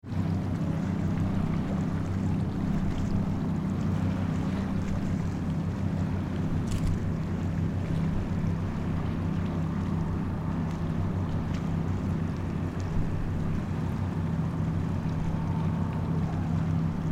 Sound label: wave